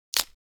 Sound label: Fruit Crack